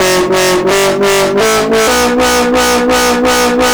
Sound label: synth 128 Bm; Electro; Anthemic; synth loops